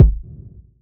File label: Kick G; Aera; Devils Gap